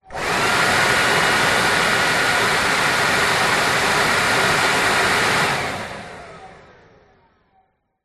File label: industrial leaf blower electric on run off short